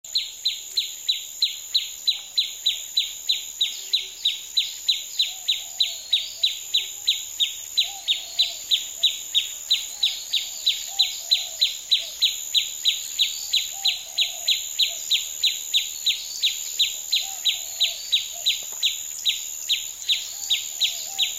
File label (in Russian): shebetanie ptic atmosfera lesa